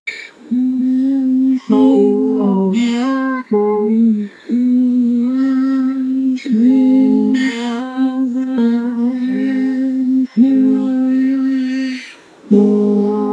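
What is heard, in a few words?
beautiful mermaids song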